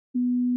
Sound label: Censor Bleep 1kHz